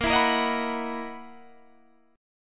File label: F sharp 13th A sharp